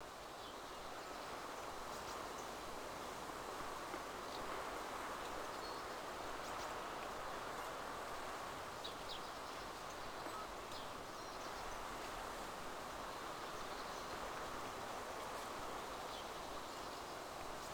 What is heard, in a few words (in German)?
spring; DayZ Standalone